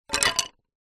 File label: foley wood pieces thin small light drop; zapsplat